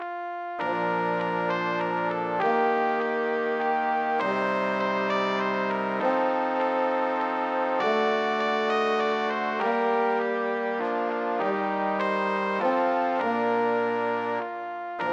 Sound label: brass quartet